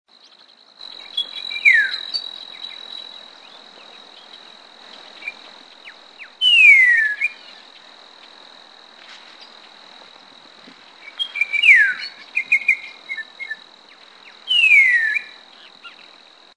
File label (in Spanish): voz pepitero gris